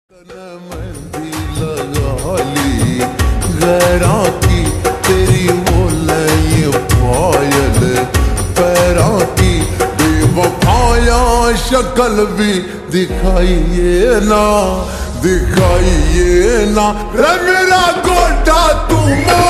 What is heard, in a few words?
Slowed Reverb Ringtone